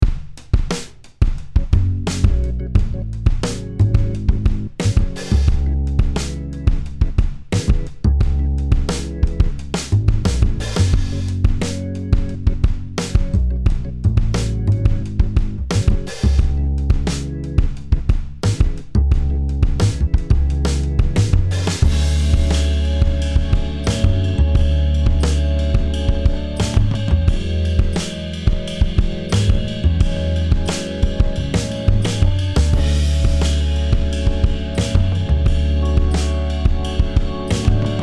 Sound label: Organ